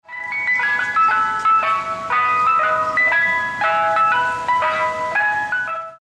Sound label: ice cream van alert